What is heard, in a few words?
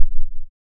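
ring modulation sin tri, env silence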